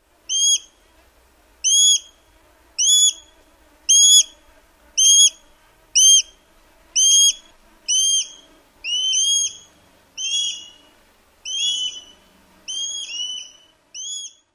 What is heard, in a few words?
squealing calls given in flight